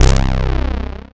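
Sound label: synth analog; Best Service Gigapack 1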